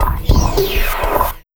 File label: Sweep Bumpers; Sonic Foundry (Sony Creative Software); Esoterik Beatz; Sweep Fx